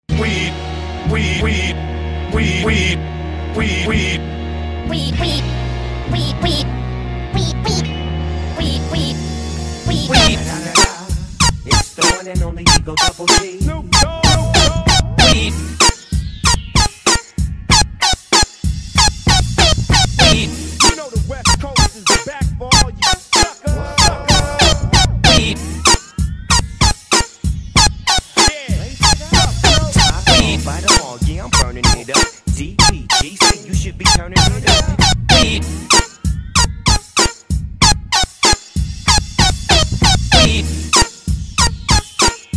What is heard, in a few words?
Airhorn Remix; MLG Sound Effect